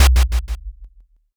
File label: taiko